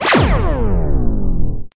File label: emitter, Weapons